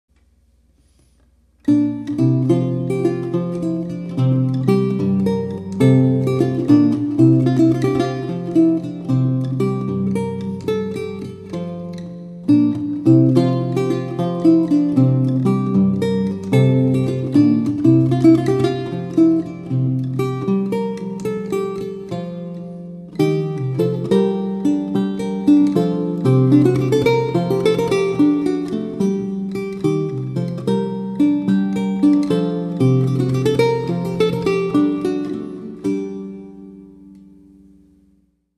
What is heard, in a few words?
Branle Poictou, Lute; Pierre Attaignant